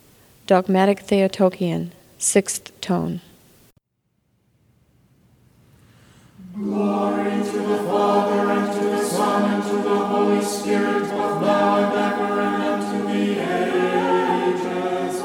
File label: Dogmatic Theotokion Tone 6